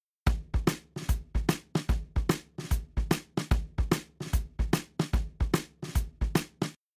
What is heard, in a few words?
Drums, shuffle drums; WDR2